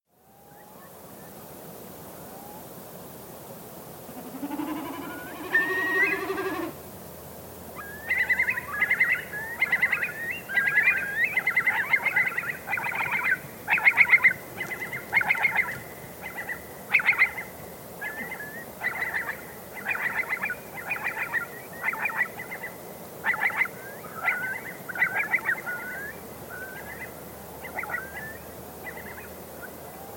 curlew calls and snipe; soundscapes

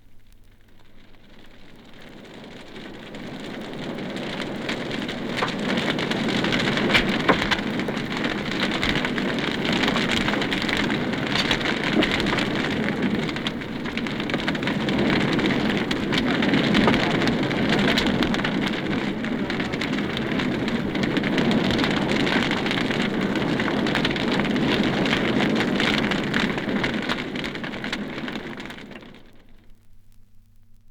burning at the stake horror

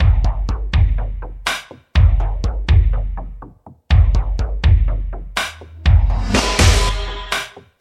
123 bpm 90s drum beat f sharp key

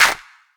reverb hand clap f sharp key; single hits one shots

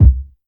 bass drum single hit c key

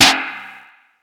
bright snare C sharp key; hits one shots

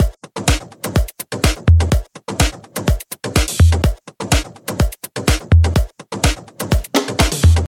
house drum roll; drum loops

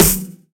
reverb snare f sharp key

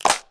studio hand clap sample G# key

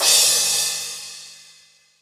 crash sample d key; Cymbal; drum sample; one shot

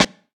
studio steel snare drum g key

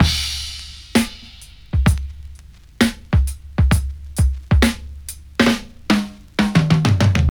96 bpm 2000s drum beat d sharp key

